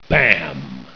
bam; tfc; vote

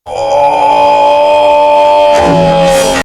ohh; bigsmoke

